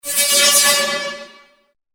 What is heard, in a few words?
phaser